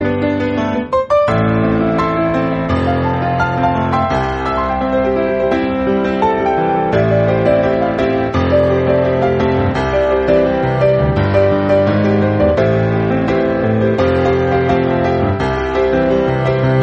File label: snapping instrumental ringtone